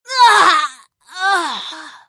female die; firefight

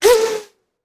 Bullet Pass By; Sound Ideas; Guns

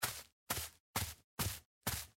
Grass Steps

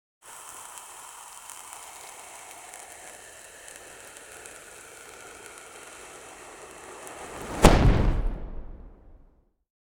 Potion Fizzes And Explodes; Sound Ideas; Series 2000

